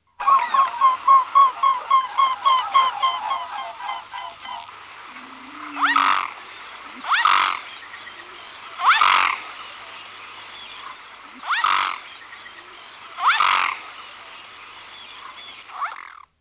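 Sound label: Mute swan; Madarak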